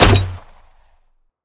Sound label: weapon; Default War Machine